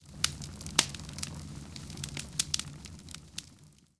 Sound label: Firewood